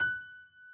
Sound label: key